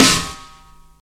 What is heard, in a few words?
huge steel snare drum D key